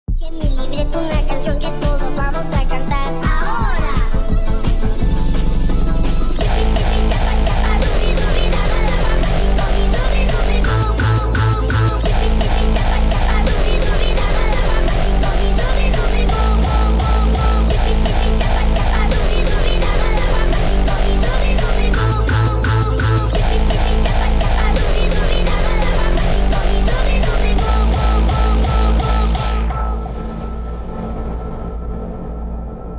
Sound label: Hardstyle